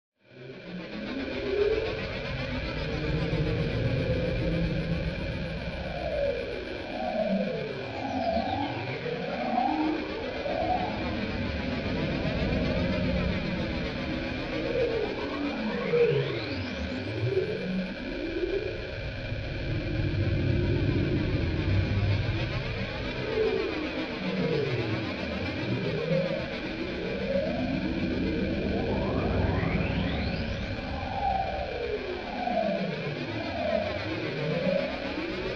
Drone Chopper Static Whoosh; Production Element Imaging Element Texture Bed Atmosphere Synth; 5.1 STEREO MIX; Blastwave

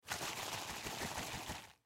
Shaving Cream Shake Can; Shaking; Objects; Blastwave; Household

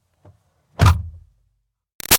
Car Highlander Door Interior Close Change; 2005 Toyota Highlander V6; Cars; Vehicles; Big Room Sound

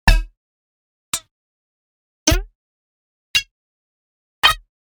5 Sounds Production Elements, Electronic; Miscellaneous Electronic Sound Effects

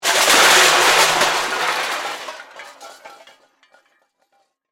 Impact Metal Crash; Crashes; Objects; BlastWave

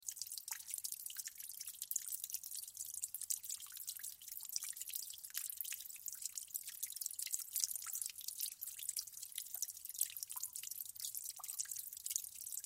Water Drips Splat Loop; Dripping; Objects; Blastwave

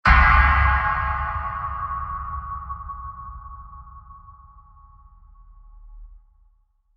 Long Deep Metal Impact Blast; Impacts; Blasts; CraftedSignals

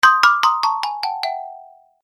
Cartoon Game Fail; Cartoon Sound Design Sound Effects

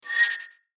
FLASH ELEMENT HIGH TECH

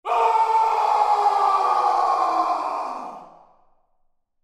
Human Scream; Scream Yell Reverb Effect Element Male 25 Yrs; Screaming; Blastwave

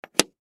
Coin Place On Table; Money; Foley; Objects; Blastwave

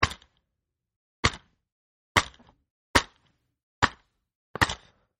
Dvd Box Case Open-6 Takes; Snaps; Dvd Case Open 01; Snap Sound Effects; Objects

